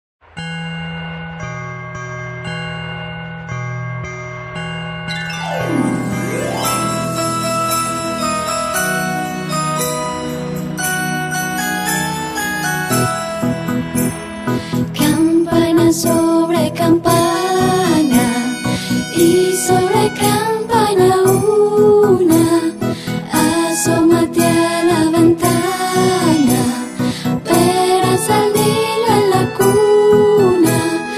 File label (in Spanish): Campana Sobre Campana Villancico Animado; Mundo Canticuentos